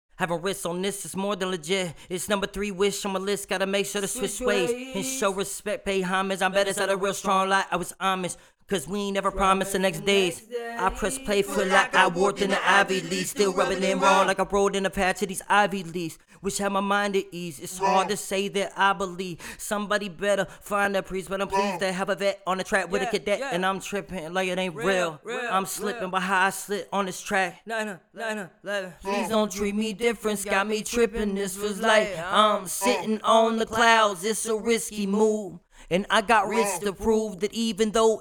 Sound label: HH 128 Gm